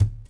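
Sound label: bodyfall metal; Star Wars Obi Wan; XBOX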